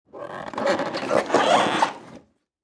Designed cinematic wood textures squeaky movement

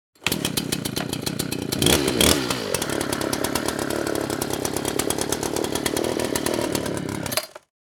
Chainsaw starting running revving and turning off

